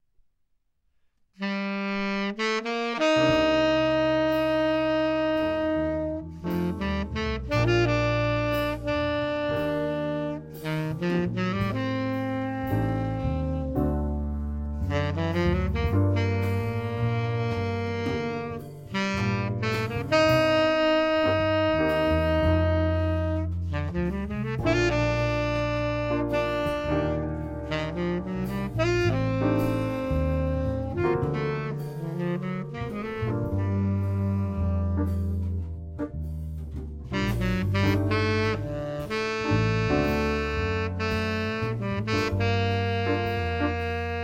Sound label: Jazz